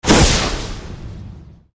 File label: spell fighter mighty attack